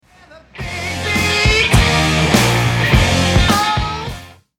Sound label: Guitar riff